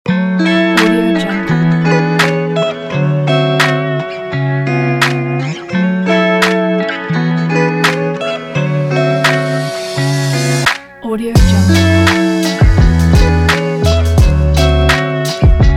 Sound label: lofi